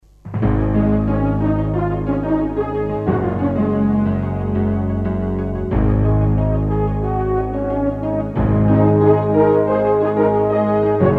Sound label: Newshour signature tune and promo